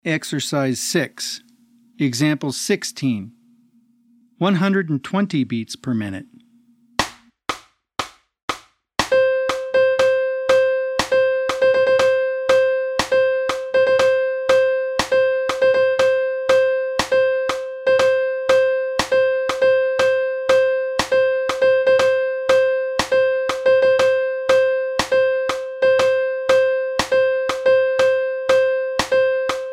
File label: 120 BPM